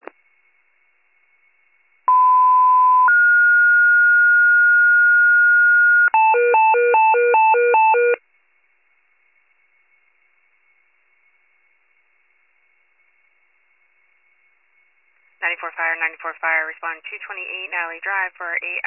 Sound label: fire, pager alert